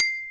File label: BOTTLE HIT C5